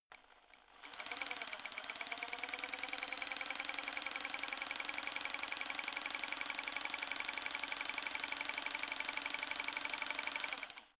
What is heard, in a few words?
rock drill chisel long settled run; maschinen; schrauben drehen hydraulik